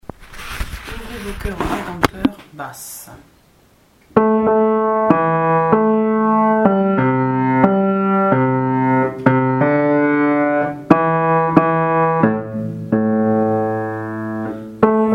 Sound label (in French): Ouvrez vos coeurs au Rédempteur BASSE; entrée envoi couplets 1 et 5